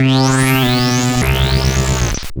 Roland C Line, Roland S100

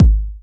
Bassdrums

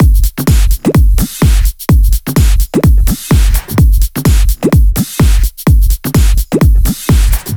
127BPM Kit; Vengeance Samples; Warhog Kit

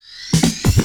Loops Fillin140; Fills; Vengeance Samples

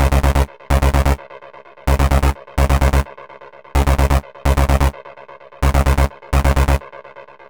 Melody Kits 128BPM Root C#; Vengeance Samples

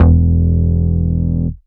Root E, Bass; Vengeance Samples; Vengeance Ultimate Bass

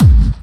Bassdrums Clubby Kicks; Vengeance Samples

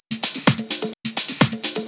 Percussive Loops 128BPM; Vengeance Samples

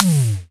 Percussion; Electro Flux Sound Kit

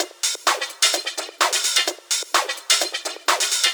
Minimal Loops

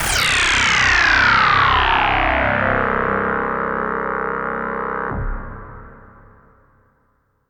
Tonal FX C

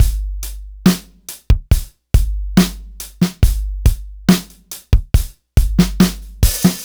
Slomo Beat 140 BPM; Vengeance Packs; vengeance essential club sounds-3; VEC3 Loops 140 BPM; VEC3 Slow Motion Beats 140 BPM

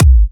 128BPM Kick, Tech House; Full Effect; Vengeance Samples; Bonus TechHouse Kits

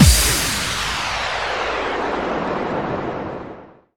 FX Reverb kicks; vengeance essential club sounds-3

